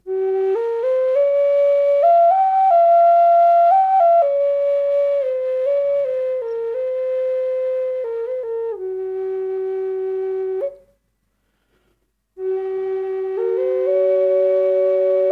G440 ohne, drone flute indianerfloete doppelfloete; olivenholz; g 440hz

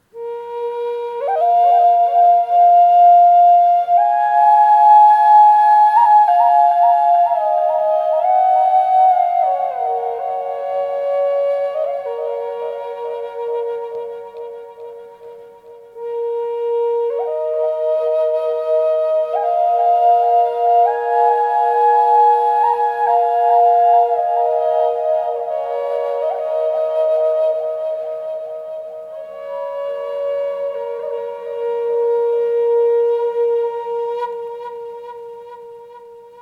High B 432 mit delay, drone flute indianerfloete doppelfloete b 432hz nuss; nussbaum

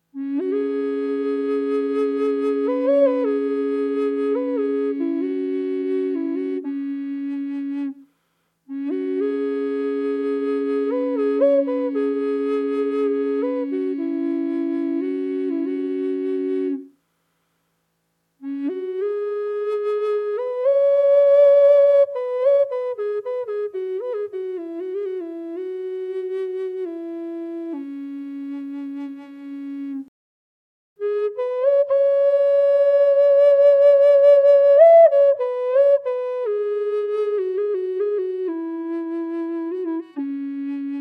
Cis ohne effekte, Drone Flute – Native American Flutes

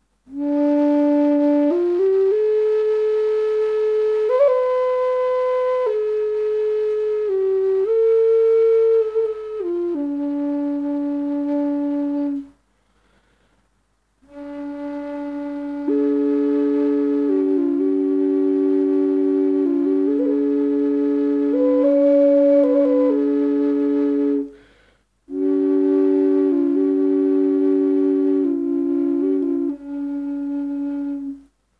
D432 ohne, drone flute indianerfloete doppelfloete; purpleheart; d 432hz

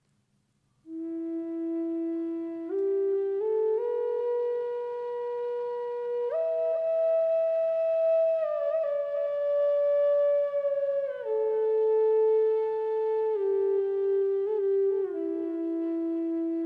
Drone Flute F 432 hall; walnuss; f 432hz

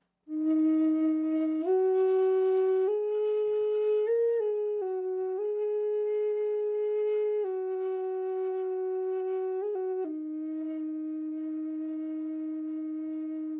Amaranth E 432 Hz ohne Echo, drone flute; ahorn

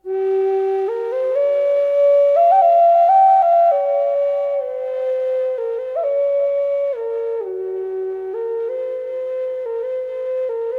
G440 delay, drone flute indianerfloete doppelfloete; olivenholz; g 440hz

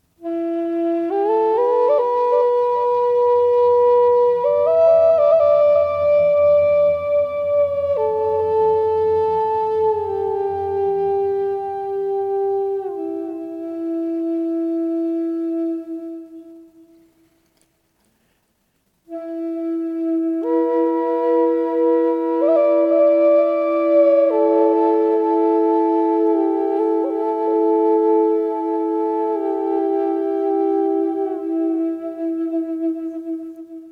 Drone Flute Indianerfloete Double Flute F432 mit hall; olive; f 432hz

